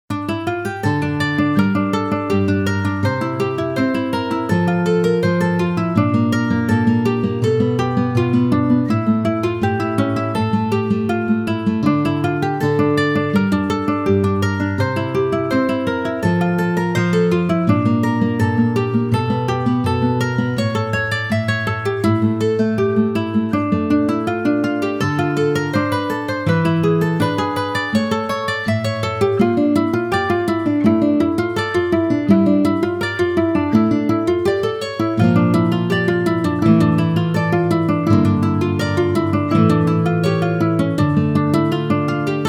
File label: larimar, guitar; アコースティックギター音色でクラシカルな雰囲気を表現。